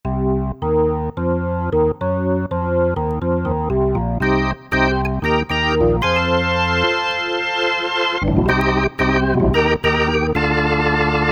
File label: Rock Organ I